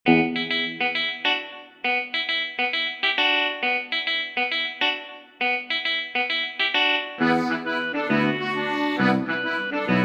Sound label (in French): rondeau descendant